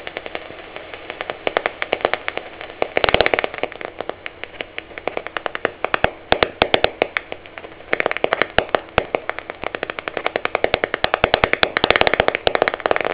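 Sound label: myotis mystacinus; fauna; sismisi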